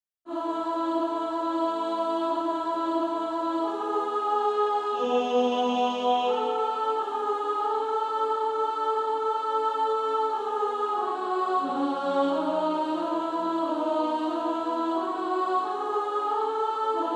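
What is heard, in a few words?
Female Choir, soprano 2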